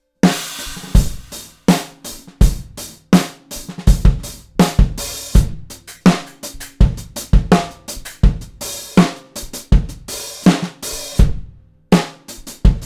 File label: Drum Bus unprocessed